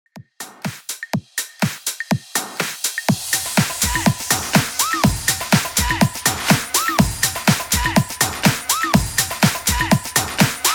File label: HYPE 123 BPM